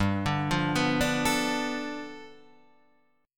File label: G 9th; arpeggiated; Standard